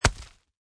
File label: Bullet hit: Projectile impacts with earth/ground.; Combat